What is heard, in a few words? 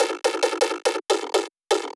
pure tonal mainstream hards, bass